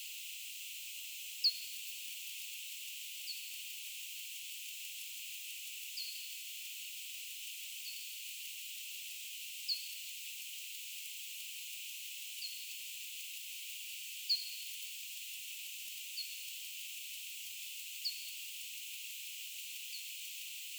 P maderensis, Tenerife; Las-Lajas; J-Barataud

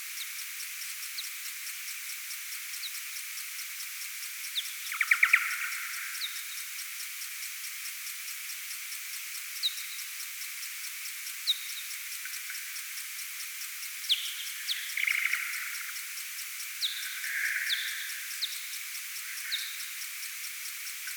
P. kuhlii FM apl sig trille; J-D-Vrignault

